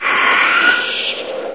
attack; source engine; bshift; controller

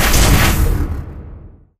platform stop

